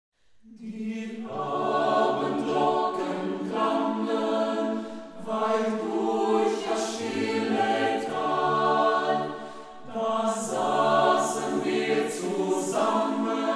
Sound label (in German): stimmen; Die Abendglocken